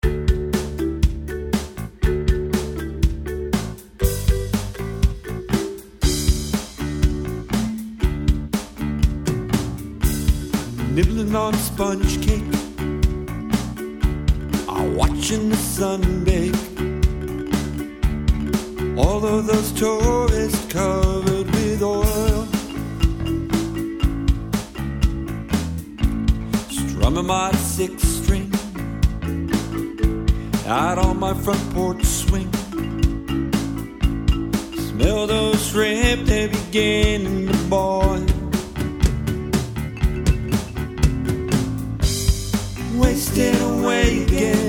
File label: guitar